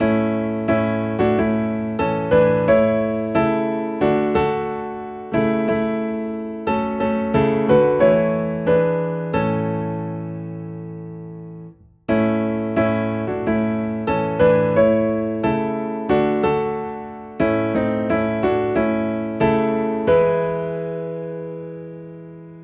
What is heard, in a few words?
Piano in A; Will Jesus Find us Watching